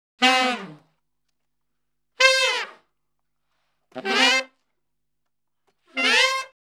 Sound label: Salsa Picante; Masterbits; World Zone VOL-3